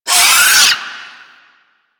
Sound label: Arm Vocal Wind Up; Warframe; Man In The Wall